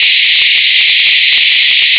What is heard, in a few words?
noise